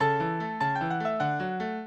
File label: minuet, mozart